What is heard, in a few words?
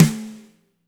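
fat snare left; Spectrasonics; Burning Grooves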